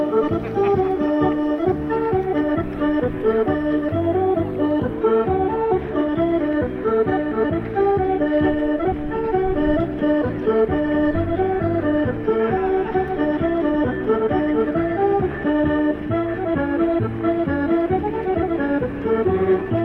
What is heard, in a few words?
music and a dancer adding to the atmosphere